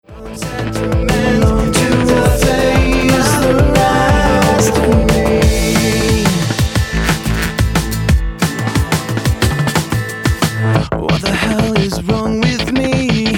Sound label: new drums and chorus